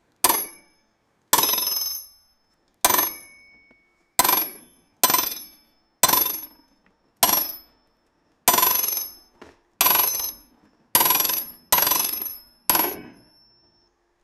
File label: repair from